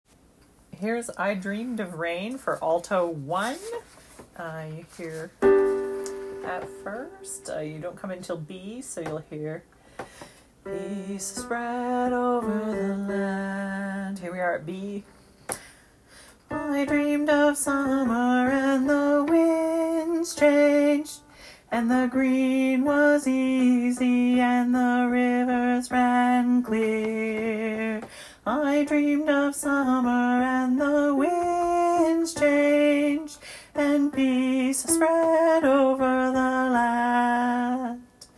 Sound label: alto